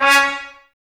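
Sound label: Brass trumpets C#, Mute; Miroslav Vitous - Woodwind Brass Ensemble; Tps Mute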